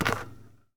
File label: Street Ball Wood Back; SFX Kit; Street Basketball